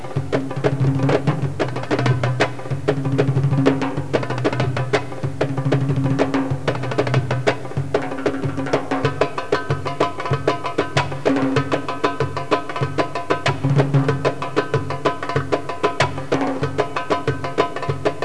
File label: trk3 drum